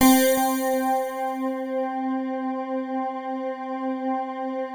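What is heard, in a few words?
PAD, atmospheric pads; Best Service; XX Large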